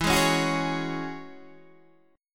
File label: E 6th Add 9th E, Guitar Standard; E6add9 strummed; JGuitar